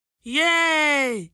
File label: yay